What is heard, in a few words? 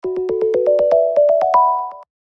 sms; ZTE; Majesty